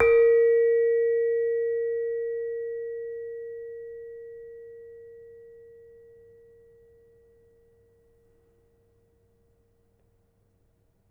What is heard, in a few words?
Demung, gamelan